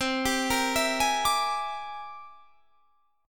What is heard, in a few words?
C 7th Flat 13th C, chord